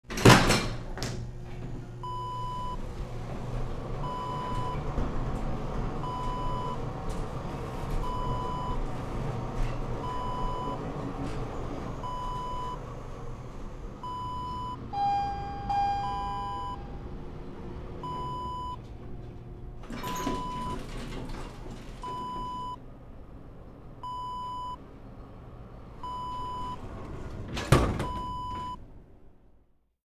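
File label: elevator interior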